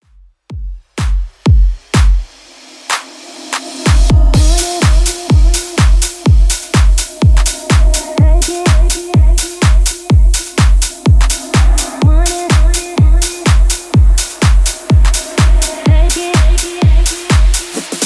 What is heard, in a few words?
Top40 Epic Intro 125 bpm